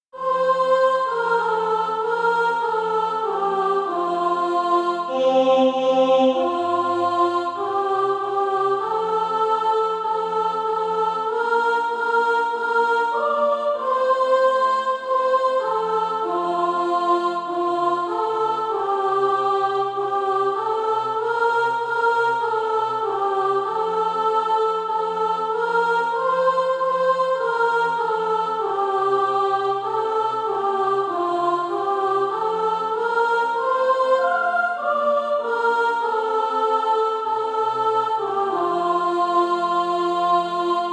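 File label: sop, Practise Music; SATB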